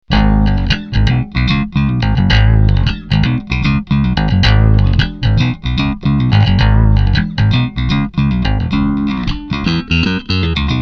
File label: riff